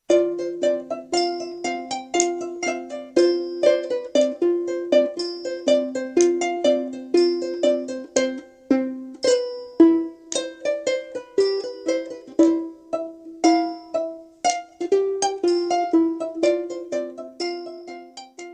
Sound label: saung gauk